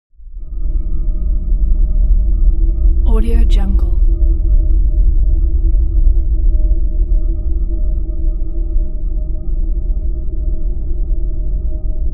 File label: atmo drone deep and calm; smartsoundfx